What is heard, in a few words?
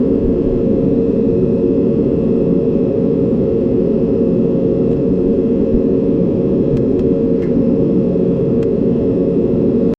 the inside background noi, submarine